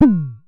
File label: Synare Filter; Star Instruments Synare 3